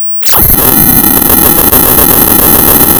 Sound of tearing paper, center

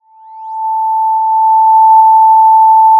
Sound of a police car siren

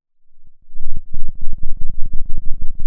pop, Sound of a thunderstorm

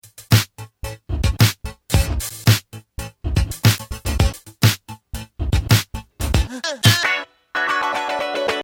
loop

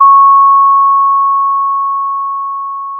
Sound of a school bell

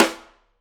Piccolo

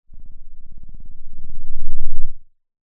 pop

